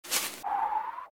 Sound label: 1-Up; Troll Face Quest Unlucky; Android